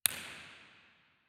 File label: Auditorium, Theatres